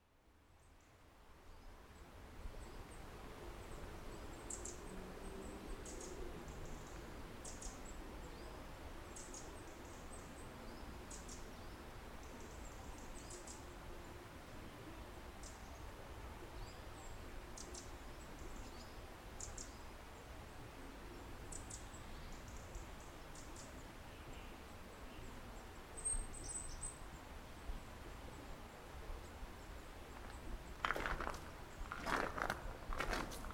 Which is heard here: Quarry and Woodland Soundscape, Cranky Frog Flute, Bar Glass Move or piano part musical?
Quarry and Woodland Soundscape